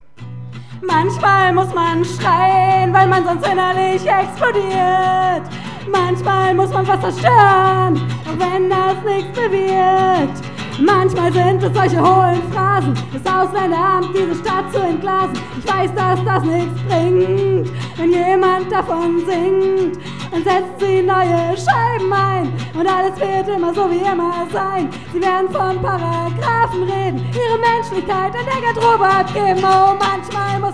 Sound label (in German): schreien